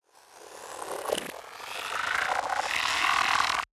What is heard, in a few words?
Movement; 99Sounds; InterSpace